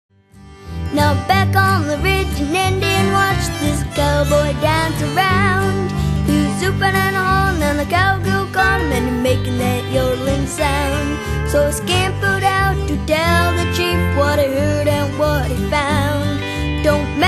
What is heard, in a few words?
Yodel